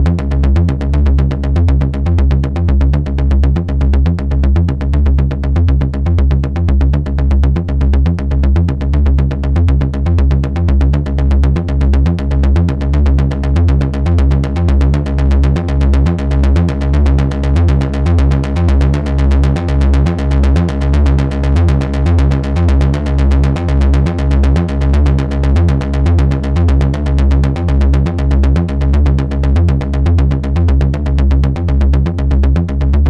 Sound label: Behringer Neutron Sequencer Bas